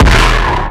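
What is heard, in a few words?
Boss DR-202 percussion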